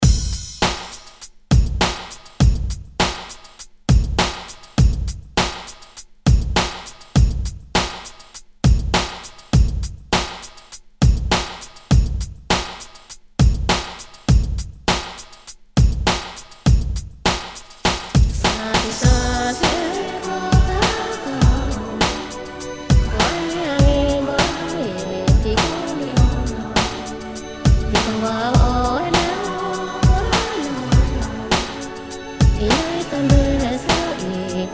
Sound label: 90s ReDrum 101 Bpm